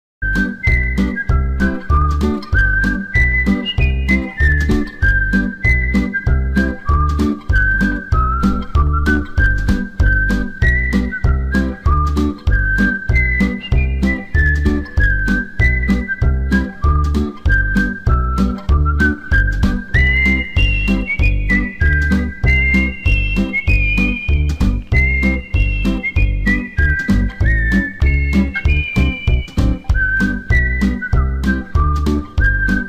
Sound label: Whistle Tune